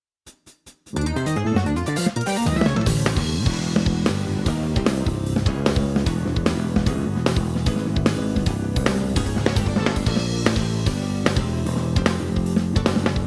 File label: backing track; G.O.D. COMPETITION素材